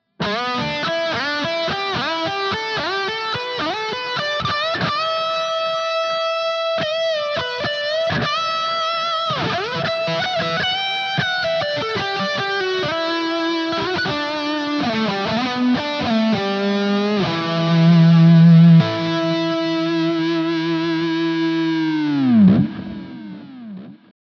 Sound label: MUSIC MAN L3 lead 1st position